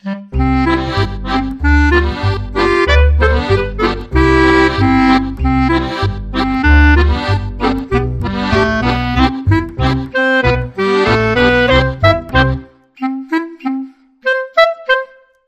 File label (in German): Klarinettenlaendler